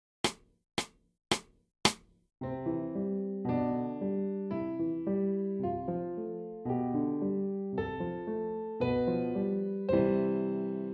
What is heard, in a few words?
BLOCKED BROKEN CHOR